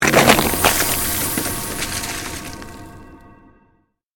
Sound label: CREATED MAGIC SPELL FREEZING TOUCH; A series of magic or arcane spell sounds designed to suit fantasy themes, Arcane,Created,Fantasy Magic,Ice Magic